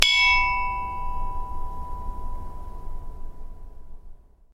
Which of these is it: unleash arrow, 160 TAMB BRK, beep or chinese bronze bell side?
chinese bronze bell side